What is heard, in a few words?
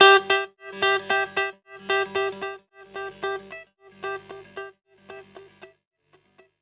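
guitar, Roots Funk; USB Soundscan vol.41; AKAI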